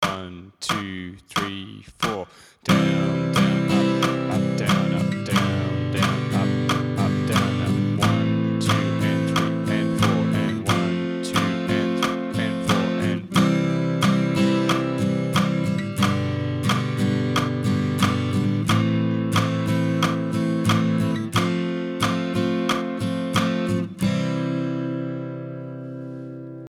4 chord song chords, guitar

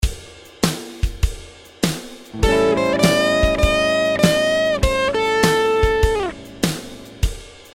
blues note bend; 3 essential notes; better blues solos

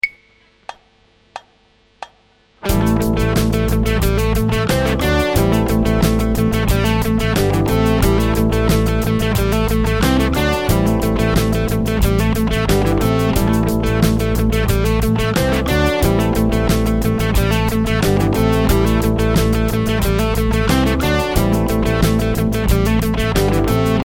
jam rhythm, guitar; Jam Rhythm #3; the root notes of the C D G A chords and the notes of the A minor Pentatonic scale

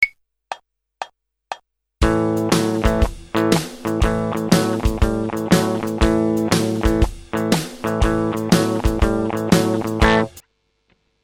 spread riff; blues rhythm

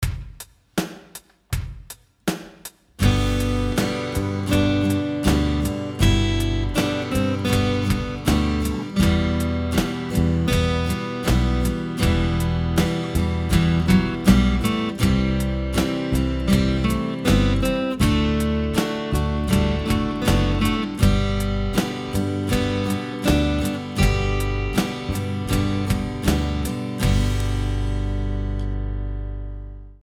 solo, guitar

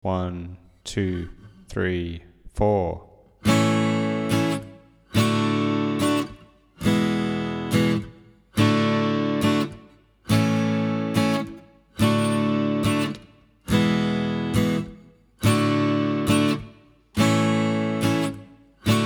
chords, guitar

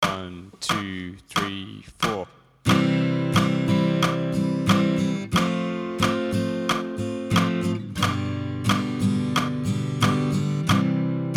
capo chords no capo, guitar

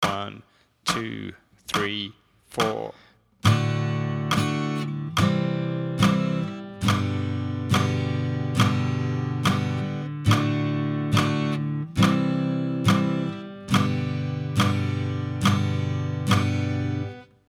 chords 4 bar, guitar

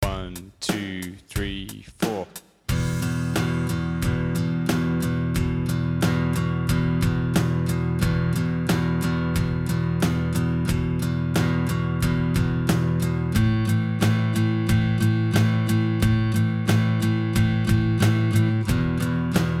crazy kid chords, guitar

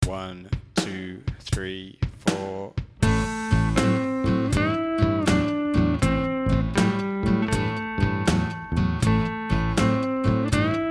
blues A solo, guitar

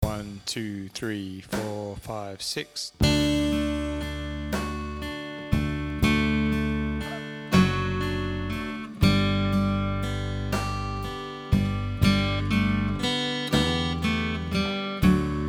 solo, guitar

